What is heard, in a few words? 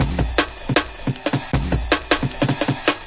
156 bpm, drum loops and breaks; 141 to 160 bpm